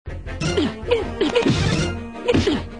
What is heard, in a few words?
boxing